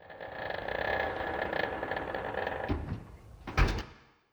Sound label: door fridge open